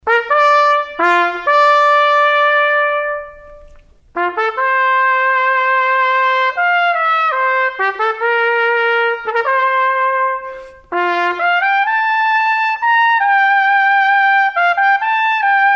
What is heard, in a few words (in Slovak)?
trubka; plechový hudobný nástroj; Trúbka (v slangu: trompeta alebo trumpeta) je plechový hudobný nástroj.; Plechové dychové nástroje